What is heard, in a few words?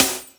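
glass snare, Boss DR660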